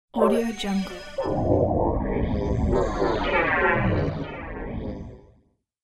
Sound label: scifi automatic transformation; fxprosound